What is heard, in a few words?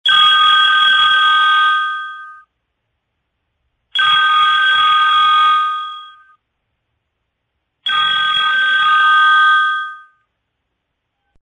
House Phone, ringtones